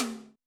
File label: TOM, Yamaha SY85 Drums